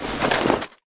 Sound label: armor; smite; god raijin